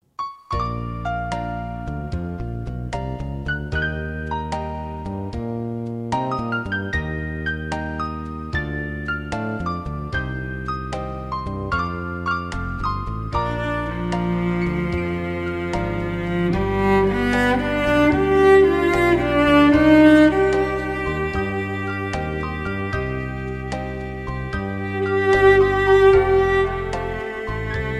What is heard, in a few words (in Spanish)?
violoncello con pista